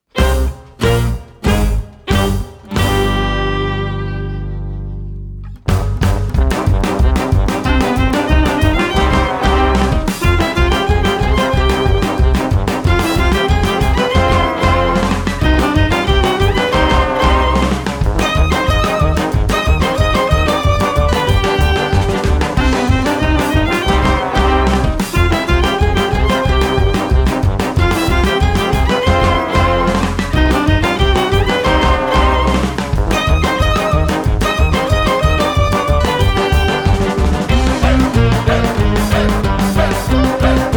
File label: Italian Balkan Klezmer; audiojungle